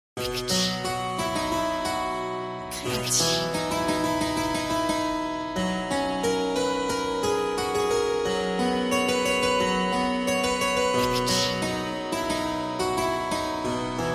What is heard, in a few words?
clavecin